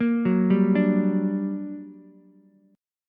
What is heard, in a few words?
G Minor Major 7th G, Ukulele; arpeggiated; 3,6,3,5